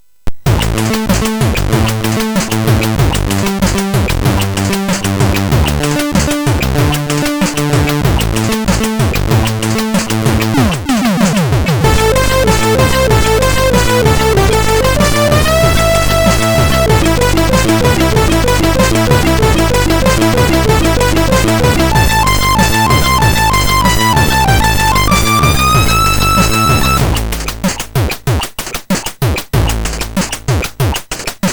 sid MOS6581R3; First tune ever

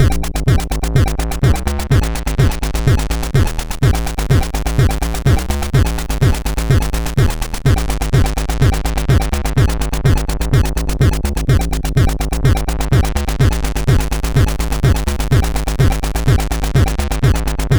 Acid, MOS6581R2

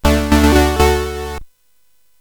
Loopz, CSG8580R5

